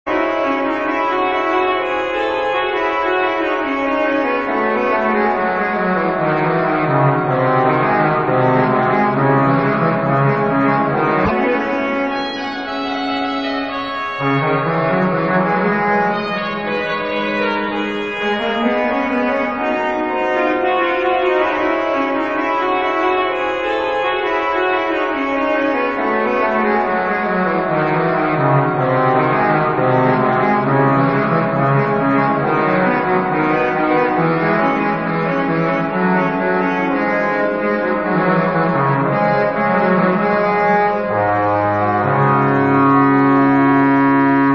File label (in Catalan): orgue